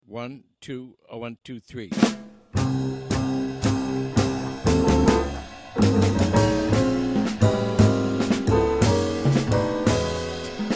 MEDIUM minus melody

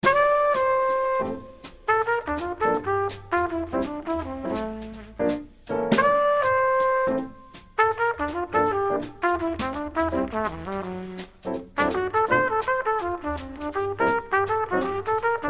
minus bass

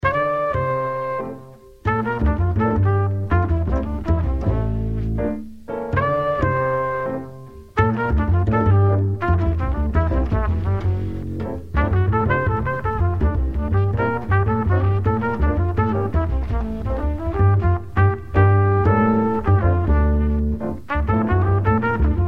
minus drums

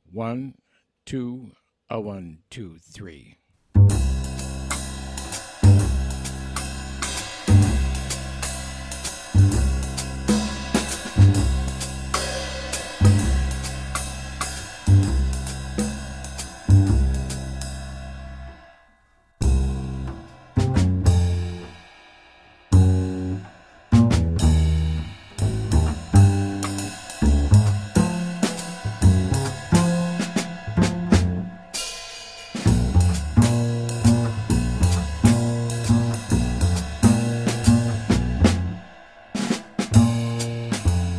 bass drums only

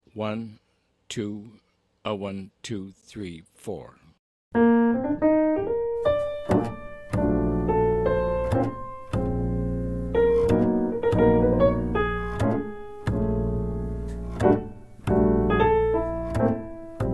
minus drums

